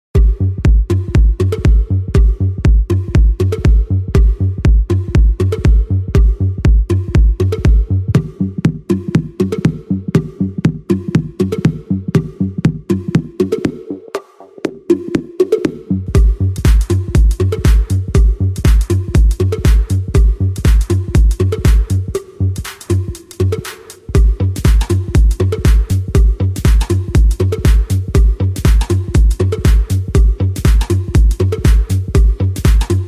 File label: Minimal; Xolid